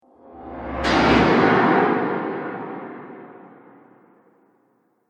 Whoosh Transition Explosion

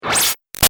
Shot Laser Burst Coarse; Sound Design; Lasers

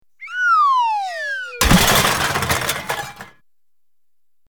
Cartoon Bigdrop, Cartoon Big Drop; Slide Whistle Down , Metal Cans Crash; Boing; Boings; Cartoons

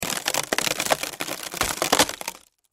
Crash Wood Crack Splinter Split Ceiling; Cracking; Blastwave

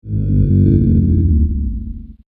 Swoosh So Low; Whoosh Sound Effects

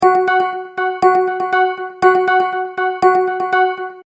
Multimedia Tone-Thinking/Processing

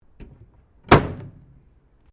OVEN MICROWAVE WHIRLPOOL DOOR CLOSE; Common oven sounds; Appliance Sound Effects; Appliances (Sound Effects:Household)

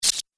Android Mechanical Snip, robot; Robot Sound Effects

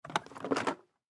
Makeup Kit Professional Pick Up; Primping Sound Effects; Human; Foley

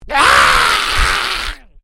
Created Creature Small Cry, Alien Sound Effects; Created creatures, vocalisations and pretend speech

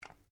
RIFLE REMMINGTON700 7.62MM SCOPE COVER REAR CLOSE

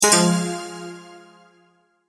multimedia tone error

